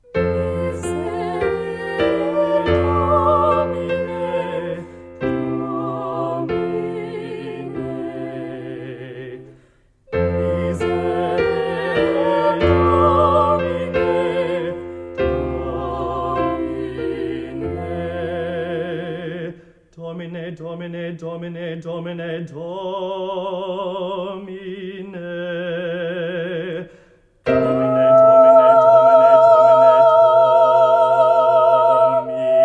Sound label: Remorse Chorus